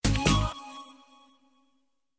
latest funny message, sms; ringtones

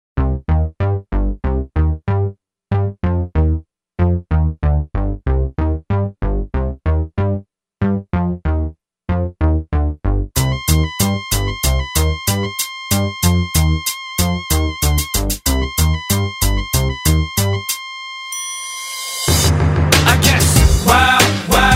Hiphop Ringtone

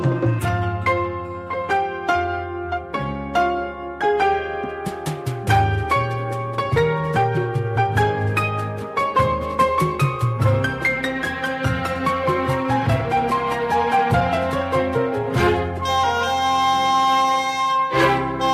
nice piano tune

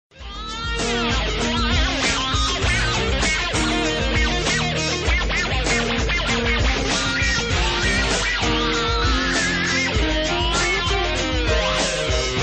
wah jam

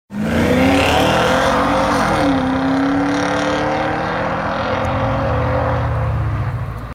Porsche Cayenne

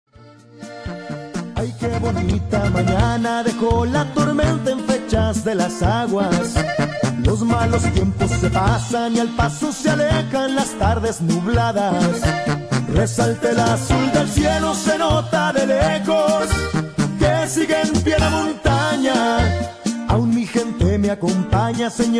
ranchero

